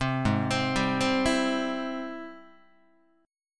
Ab Augmented Major 7th Ab Guitar 4A,4E,5A,5D,5G sharp,6C sharp; arpeggiated; 3,4,3,5,4,3; JGuitar